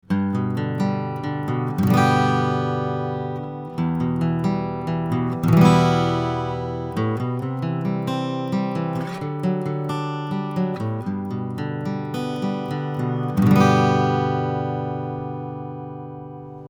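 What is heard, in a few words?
Schoeps CMC6MK2S Acoustic Guitar Pick 20cm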